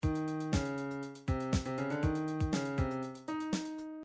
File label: funk, midi